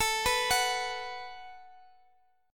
Gb Minor Double Flat 5th

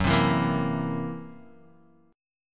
F sharp 7th Flat 5th Guitar, F#7b5 chord; strummed; JGuitar

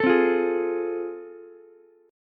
A Minor Major 7th Sharp 5th, Ukulele; strummed; AmM7#5; JGuitar

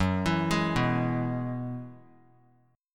Gb 9th Suspended 4th Gb down, chord; Gb9sus4; arpeggiated; JGuitar

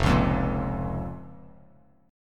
F Suspended 4th Sharp 5th F; strummed; 1,4,3,3; JGuitar

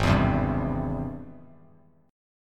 G sharp Augmented Major 7th G sharp Bass Standard; G#+M7 strummed; 4,7,10,0; JGuitar

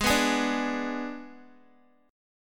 Ab Diminished Ab Guitar; strummed; x,11,11,13,14,x

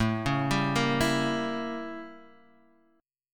A 7th Flat 9th Guitar Open D; 7,4,5,4,7,x

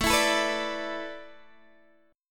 A Suspended 2nd Suspended 4th down Guitar Open D

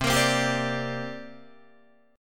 C 7th Suspended 2nd C down Guitar Open D

